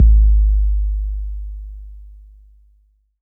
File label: BD 808 Tape Decay